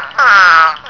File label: eastern spadefoot call; texas nature trackers; amphibian watch